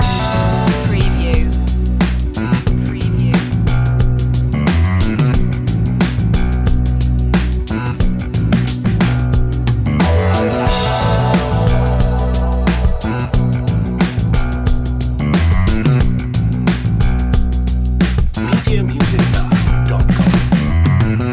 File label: slow funk reggae loop